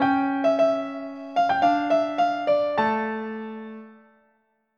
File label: SONATA